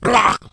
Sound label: Murloc Scout Death